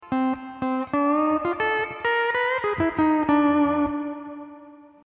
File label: hawaiian piece